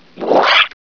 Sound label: headcrab alert; gearbox